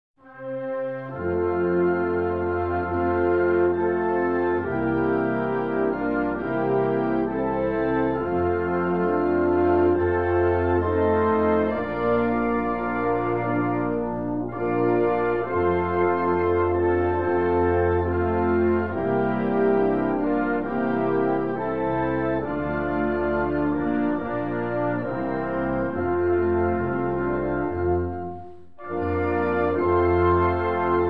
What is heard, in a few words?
graduation song